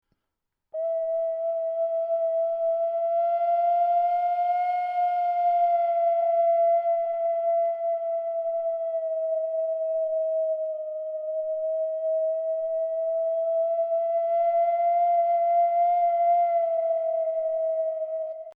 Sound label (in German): Ocarina Atemdruck Ton E